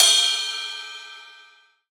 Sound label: Long Trap Ride Cymbal G# Key, ride cymbal sound sample tuned to the G# note; cymbal drum